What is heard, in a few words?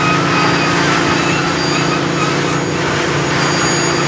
tram motor; Sourceforts v1.9.2; hl2; plats